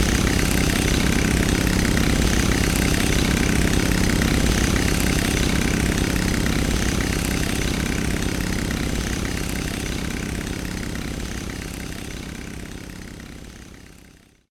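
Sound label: Music Ambient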